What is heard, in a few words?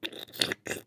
glue slurp